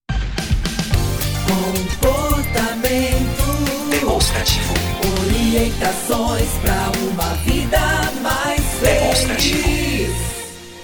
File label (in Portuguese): Vinheta Programa, jingles vinhetas cantadas